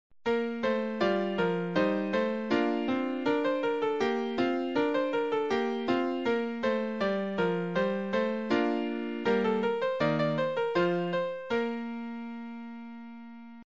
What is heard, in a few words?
piano